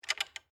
Door Latch Lock, Foley, Door, Latch, Lock, Door, Glass, Window; Miscellaneous Door; Blast Wave